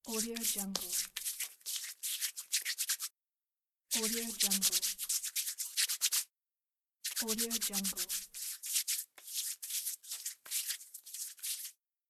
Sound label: hands rubbing